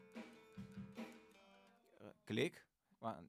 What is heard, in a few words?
Voc